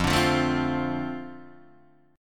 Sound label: F 6th F down Guitar Open D